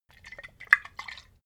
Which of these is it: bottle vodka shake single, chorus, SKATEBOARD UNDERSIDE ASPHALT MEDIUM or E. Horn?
bottle vodka shake single